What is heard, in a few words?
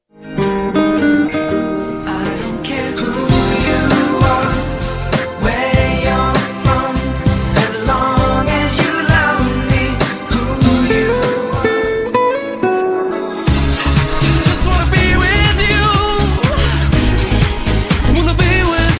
mixtape ringtone